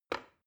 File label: plastic button start release regular